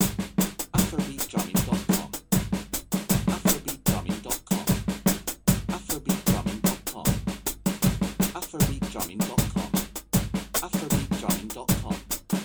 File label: Syncopation with two hands, afrobeat drumming